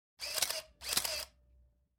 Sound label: click camera photo